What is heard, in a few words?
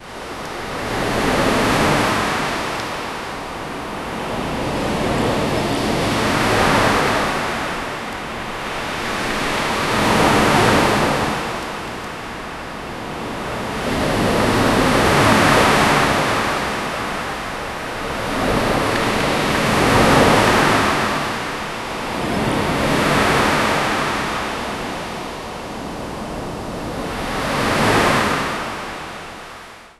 ocean waves gently crashi; seagulls